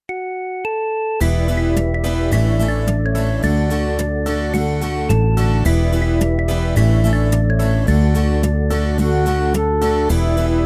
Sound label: They are happy who follow God's law BACKING